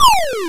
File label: YM High Fall; SFX; ChipShop Deluxe; ChipShop Hits